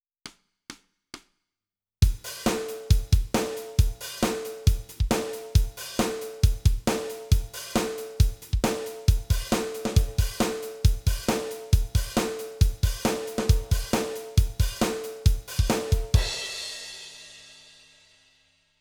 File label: Drums